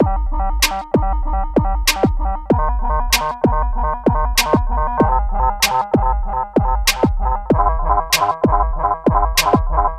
PHATT BEA, Hip Hop

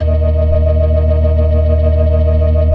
FAST VIB, ORGAN, TREMOLO VIB

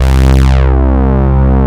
DANCE SYNT, techno trance

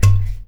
PHOOMP, household; AKAI Anime Sound FX

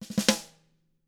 RUFF, SNARE; SNARE ROLLS; ILIO; Double Platinum Drums 1

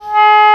Oboe p G#3; Digital Sound Factory Virtuoso

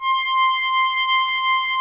K3M saw C6; Greytsounds; Scott Peers Sound Engineering

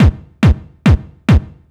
Kick, Trance, Drums; Sonic Foundry (Sony Creative Software); Trance NRG VOL-1; Kicks

